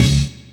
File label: Bass Stab